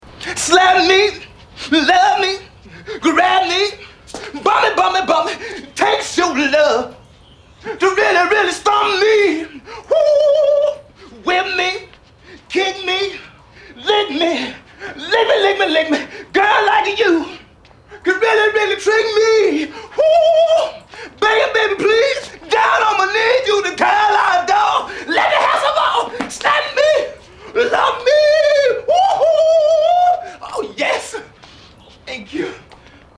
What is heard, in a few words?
slap me